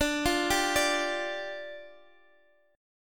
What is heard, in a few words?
D-Minor Double Flat 5th, D, Guitar, Open D